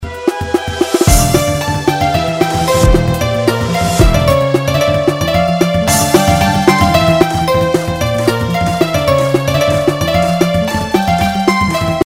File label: MUSIC